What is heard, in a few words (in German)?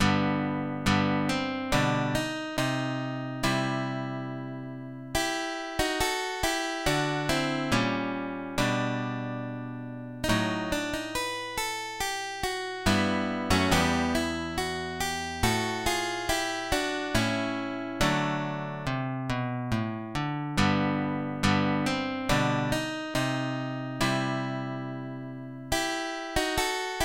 Langsam, guitar; Albert Heinrich